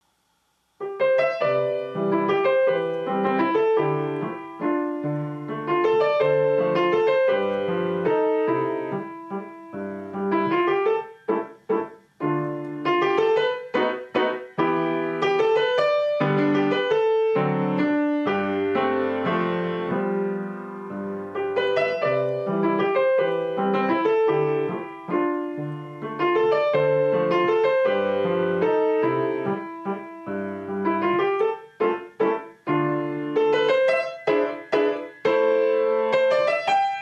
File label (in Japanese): ピアノ; ピアノ発表会おすすめ; ウィンナーワルツ; ギロック; In Old Vienna Gillock